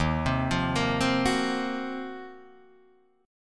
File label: Eb Minor 6th Add 9th Eb down Guitar DADGAD